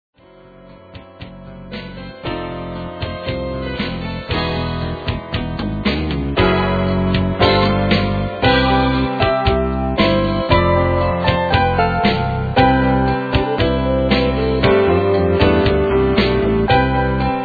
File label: casio